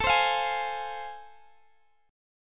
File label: A Minor Major 7th Sharp 5th A, Guitar, Standard; strummed; x,x,19,17,18,16; capo=2